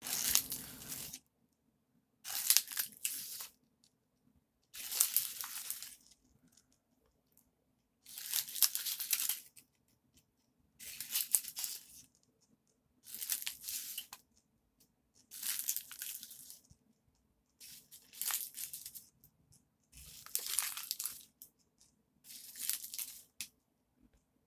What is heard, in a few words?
Cracking Leaves